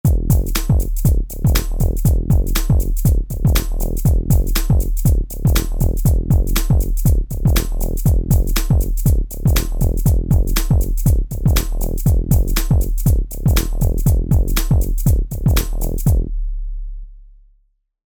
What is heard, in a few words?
TripHop